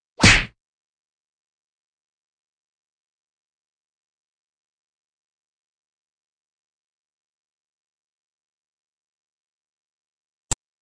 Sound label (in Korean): Fights, 싸움-전투; 헐리웃특수효과음